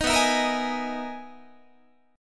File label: B Major 13th, down, Guitar, BM13 chord; strummed; JGuitar